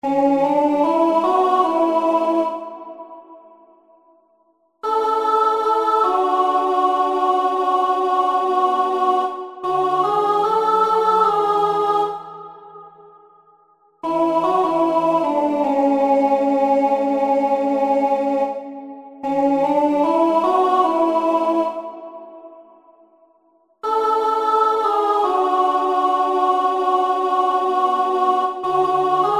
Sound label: Chorus Tune Sop